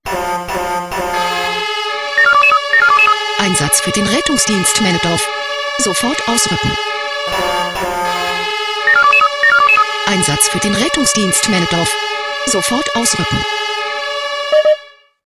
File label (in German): SMS Alarmierungstone; RD Maenned